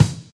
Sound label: treble rich kickdrum sound c sharp key